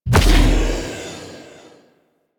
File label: Edge Kick Large; Warframe; Warframes; Pacifist